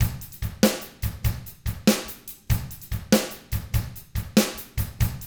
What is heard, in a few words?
rock 6/8, drums; ILIO; Double Platinum Drums 1